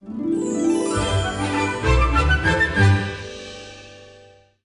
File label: Stinger Win Intro; Candy Crush Soda